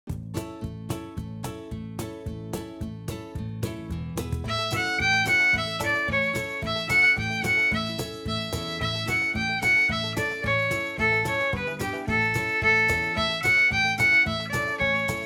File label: Violin